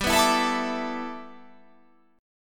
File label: G 6th Add 9th